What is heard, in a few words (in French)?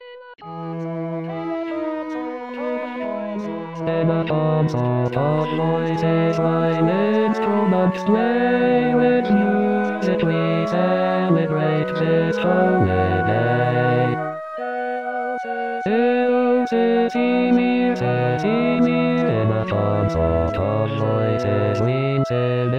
basse; baroque